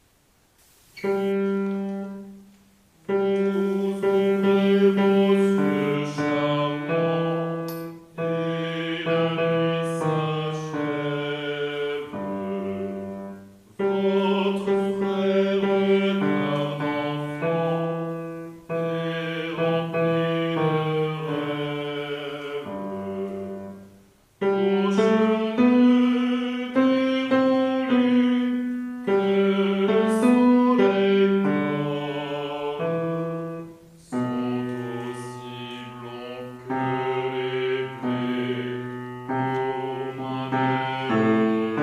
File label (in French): Basses; O ma belle Aurore